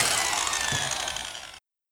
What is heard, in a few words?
whir down short